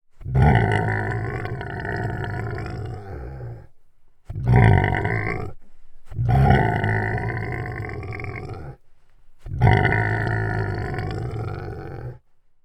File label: Pig Snort; Coll Anderson; GDC Game Audio Bundle